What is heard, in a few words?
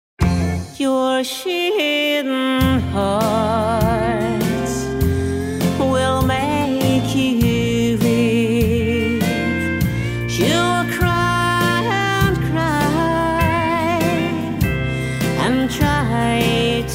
country parlor